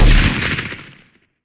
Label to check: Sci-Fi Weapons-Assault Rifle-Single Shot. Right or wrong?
Right